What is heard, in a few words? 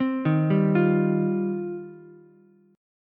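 E flat 6th Add 9th E flat, down, Ukulele, C6; arpeggiated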